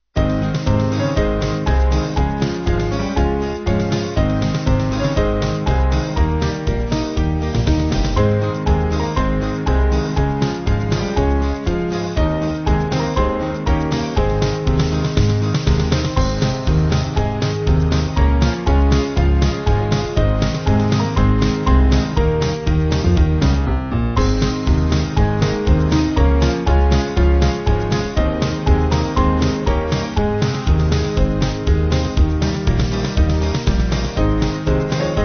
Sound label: Band